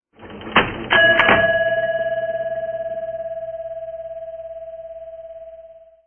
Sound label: cash